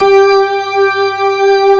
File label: ORGAN G3; AKAI S-Series CD-ROM Sound Library VOL-1